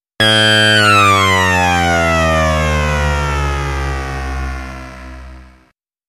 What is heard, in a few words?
trance